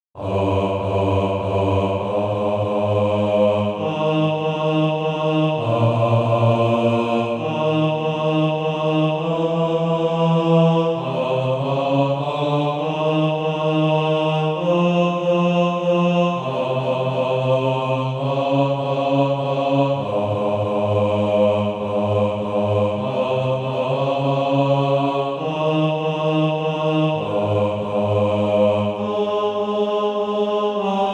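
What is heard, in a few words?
bass, SATB Practise Music; Down from Their Home on High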